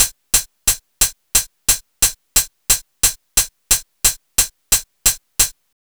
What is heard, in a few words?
HH, Pure Electro; USB Soundscan vol.03 - Pure Electro; AKAI